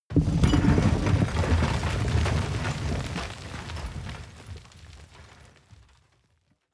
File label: explosion large shrapnel dirt; explosionen; comedy-cartoon; soundpool